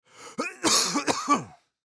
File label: Human Cough; Cough Clear Throat Male Fifty Yrs; Human Cough Clear Throat Male Fifty Year Old; Sick; Blastwave